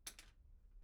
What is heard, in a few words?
pendrop; IEEE AASP